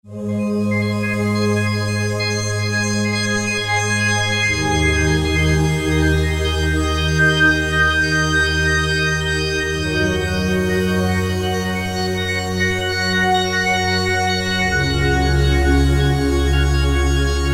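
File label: Pad, Yamaha EX5R; Factory presets; Pd-Abendstern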